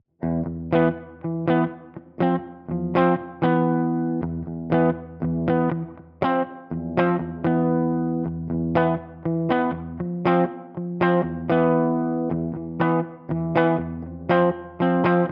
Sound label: Boss SD1 Clean